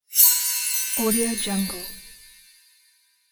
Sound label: magic swoosh